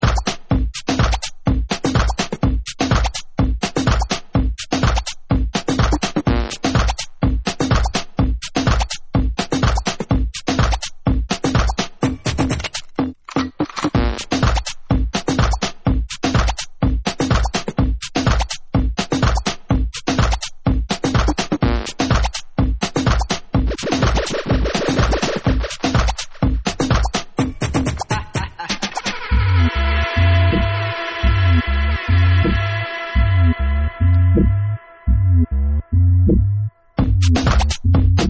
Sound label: Instrumental